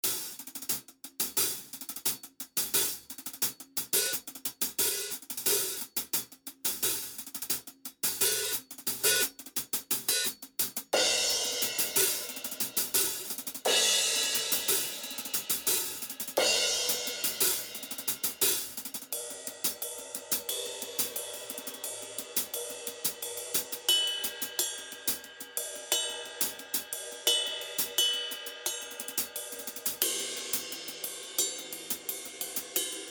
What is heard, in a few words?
Meinl M Series